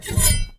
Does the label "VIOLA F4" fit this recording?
No